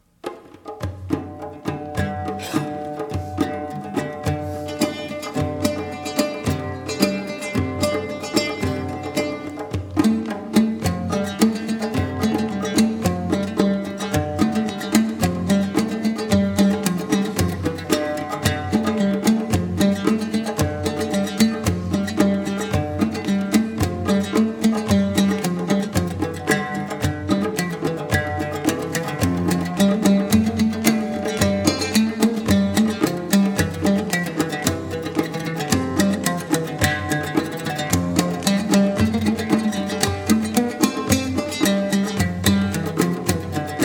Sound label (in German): musik